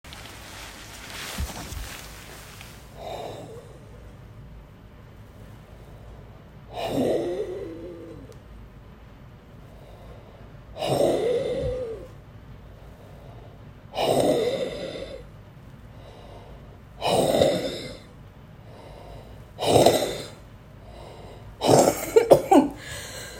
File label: Low lung volume huffs too many